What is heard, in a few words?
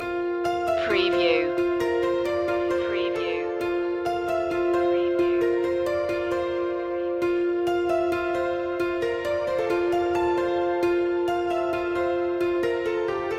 aspiration long looping